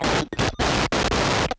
Digital Noise, layering samples

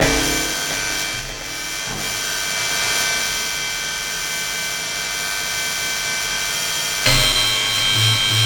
Percussive Noises; Sonic Foundry (Sony Creative Software); Loop Noir

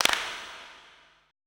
E CLAPS, ETH PERC

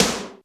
Snr MIT Gate, Alesis D4 drum machine

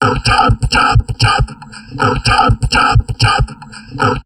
TALK 120, Drum Loops Crazy Processed; USB Soundscan vol.07; AKAI

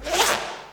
ROOM WHIP, PERC; Crypton; DrumBase5000; PERC.COMBI

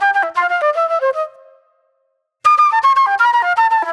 Jammy Flute

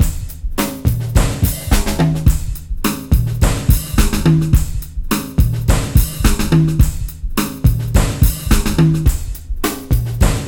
29 FUNKY MONKEY 106 bpm, Loops Breaks; POTBreaks

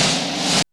REV SNARE; Back In Time Records; Big Bang

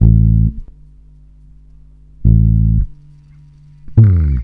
bass, Roots Funk; USB Soundscan vol.41; AKAI

